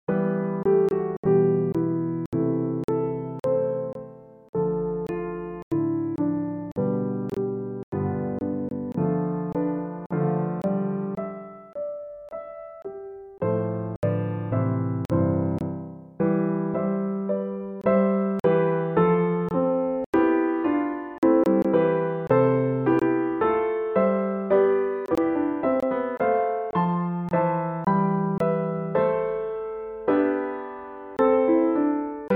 Writing For Piano

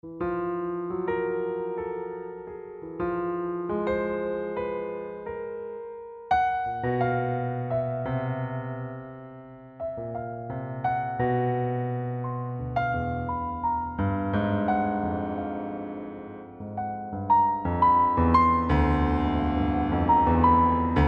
ten finger exercise for piano

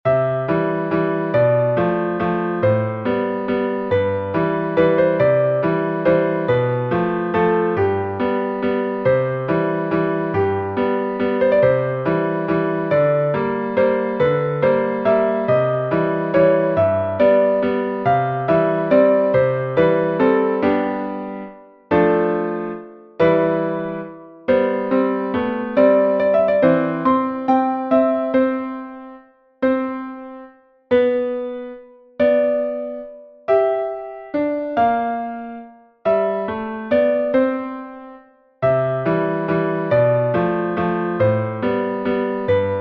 Piano

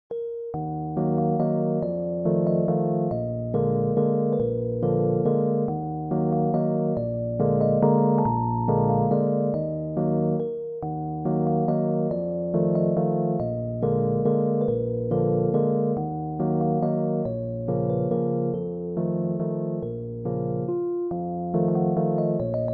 Little Waltz in E-flat minor

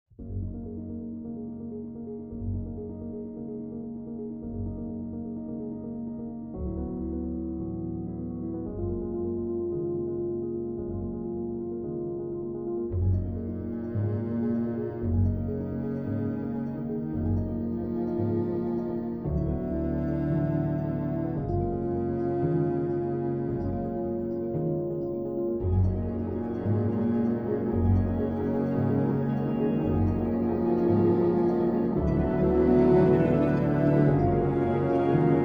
Arpeggios

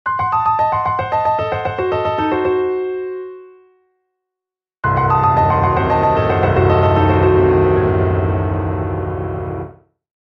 Theme or motif

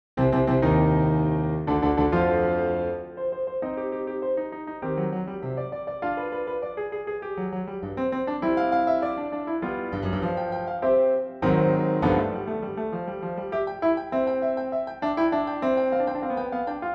sonata; new sonata

